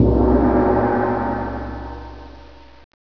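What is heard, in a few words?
Japanese gong; cs hokkaido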